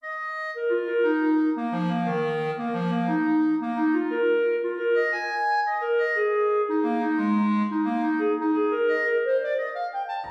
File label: Clarinet and Piano Little Fugue